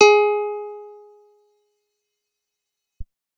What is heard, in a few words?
guitar acoustic, acoustic guitar nylon